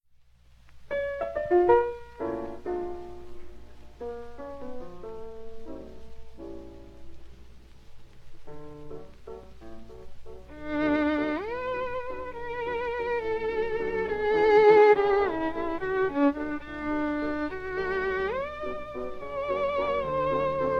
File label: Valse Bluette, Violin, LIVE RECORDINGS; DRIGO